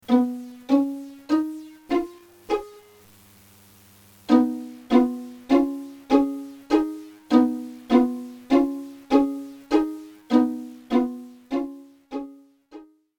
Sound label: Nzakara Harp Songs; Brenner; Vienna 2007